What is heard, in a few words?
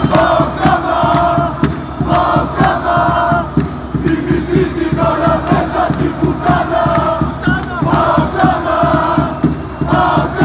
chants, greece